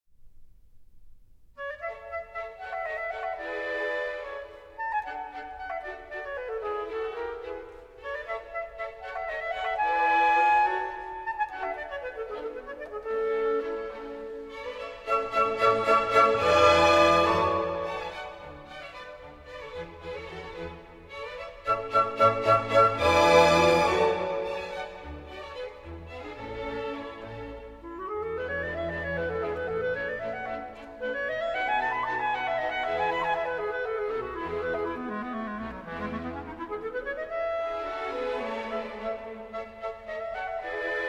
Clarinet Concerto in A Major Rondo Allegro; Clarinet Concerto in A Major, K. 622; III. Rondo (Allegro)

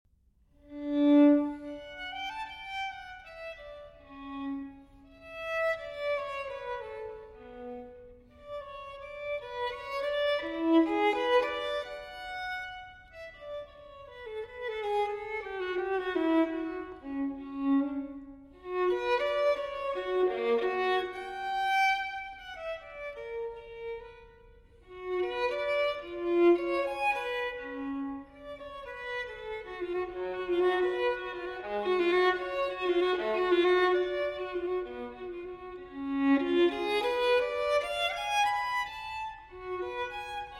Solo Violin in E-Flat Major Dolce; TWV 4020; Telemann Fantasia

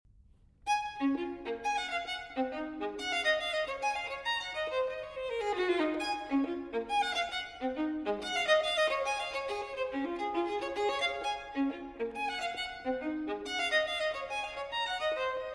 Solo Violin in D Major Allegro; No. 10; TWV 4023; Telemann Fantasia

